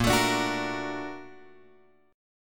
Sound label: A sharp Augmented Major 7th A sharp, chord; A#+M7 Chord {6 X 7 7 7 6} strummed